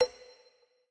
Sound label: count up loop end; rise of maya